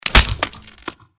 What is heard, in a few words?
mantis death; npc